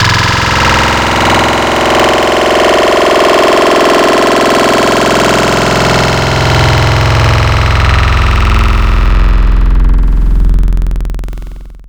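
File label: bass one shot; Synth One Shots; Synthetic's Serum Collection; Echo Sound Works Core Tables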